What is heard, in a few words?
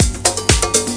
PARTY PERC